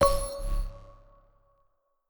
bonus Cell Selected; netent

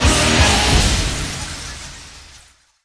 big win end; netent

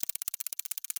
Reel Spin Loop

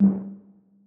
slot reel stop

